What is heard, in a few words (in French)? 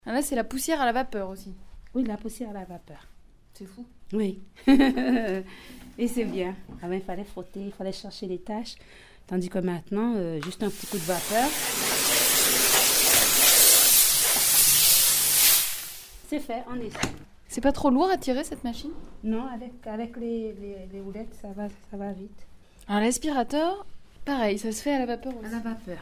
Nettoyage impeccable grace a l aspiration vapeur